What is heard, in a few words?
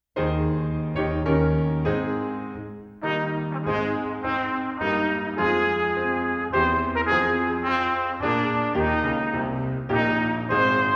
The Star Spangled Banner performance; canadian brass easy solos